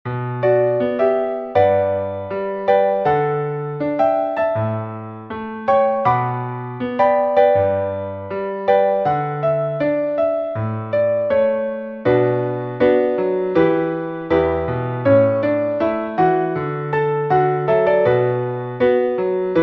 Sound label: Moving On, piano, balladen